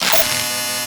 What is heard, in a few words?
angry; tonate